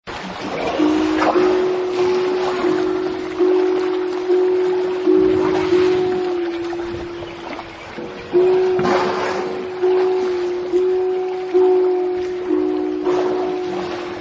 Pacific Walrus; Diane Okonek; AK Fish Game

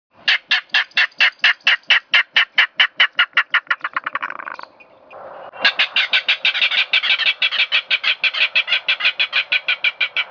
Yuma Clapper Rail; Naturesongs

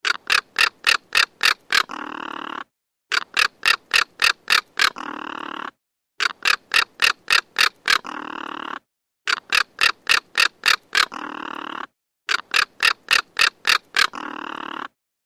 California Condor

